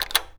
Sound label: SWITCHES; AKAI Anime Sound FX